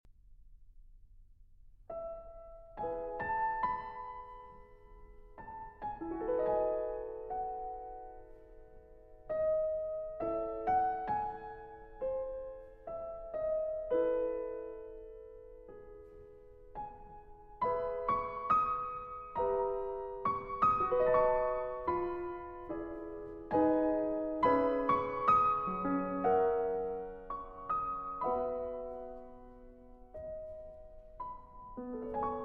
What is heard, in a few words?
Violin Sonata No. 3 in C Minor, Op. 45 II. Allegretto espressivo alla Romanza